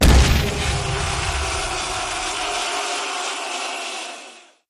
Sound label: big Win Start; warlords